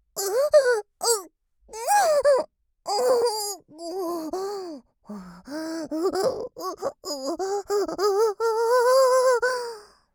お?♥おっ♥おおっ♥おほぉ…♥

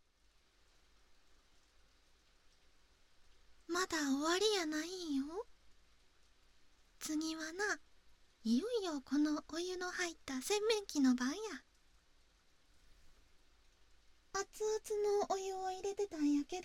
フェイスマッサージ

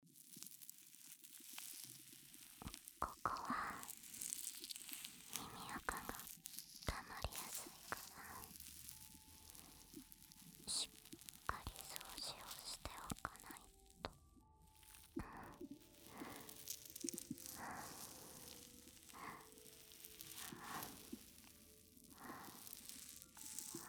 ゆったり毒針耳かき, ASMR

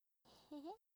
笑い声; ループボイス

